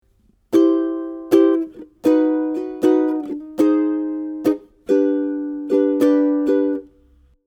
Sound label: dream chords, ukulele